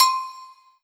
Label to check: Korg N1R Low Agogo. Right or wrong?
Right